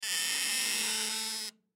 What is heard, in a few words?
Wood Gate Creak Loud; Gates; Foley; Blastwave